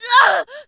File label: female pain hard; firefight